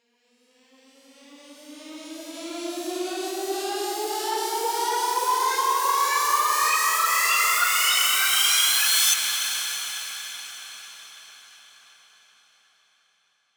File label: Go Up, Risers - Uplifters; SFX